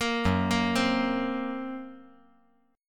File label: Gb Major Flat 5th, down